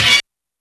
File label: HORN HIT; E-MU Formula 4000 Series Vol. 1; Hip Hop Nation; Hip Hop Kit 2